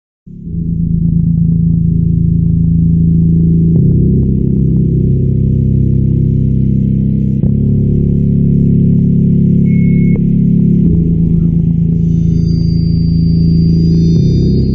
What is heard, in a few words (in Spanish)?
musica de terror creepy